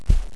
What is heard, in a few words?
dirt